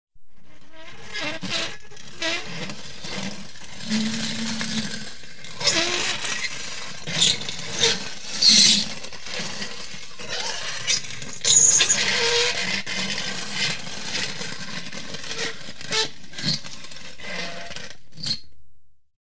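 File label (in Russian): скрипят и двигаются металлические части конструкций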